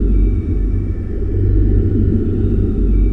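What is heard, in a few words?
teleprts, teleports; Seed Demo; factory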